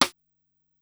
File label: Sidestick High, Korg Wavestation-SR, Drum Kit; Wave 455 - Drum Kit